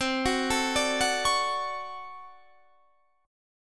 D Major 7th, chord; Dmaj7/C; arpeggiated; JGuitar

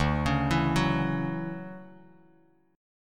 D-Major 9th D down Bass Standard; DM9; arpeggiated; JGuitar

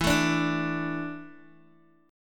E 7th Suspended 4th, Guitar, Open G

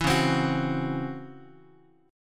Db Minor Major 11th Db, Bass Standard; strummed; JGuitar

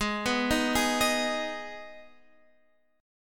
Eb Suspended 4th Sharp 5th, Ab

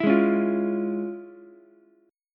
F Minor Major 7th Sharp 5th Ukulele; FmM7#5 strummed; JGuitar

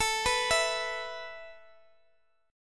E 5th A; E5/A arpeggiated; JGuitar

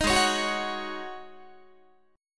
Ab 7th Suspended 4th Ab down Guitar; Ab7sus4 strummed

